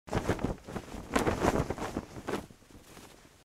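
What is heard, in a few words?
flag i veter, flag and wind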